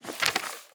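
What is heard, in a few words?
ui photo unfold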